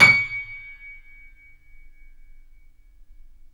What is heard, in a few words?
KEY, Steinway ff; Roland L-CD701